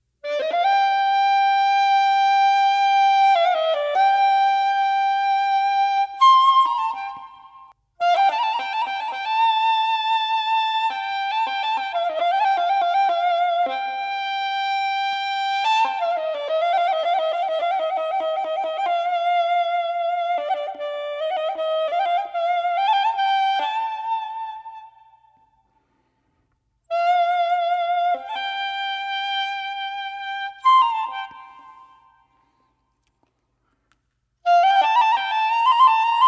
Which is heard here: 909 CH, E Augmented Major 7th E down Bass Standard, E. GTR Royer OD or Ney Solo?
Ney Solo